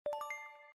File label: notice